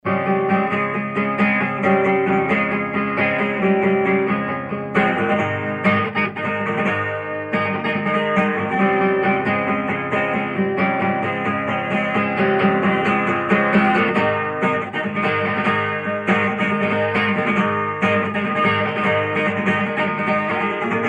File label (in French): guitare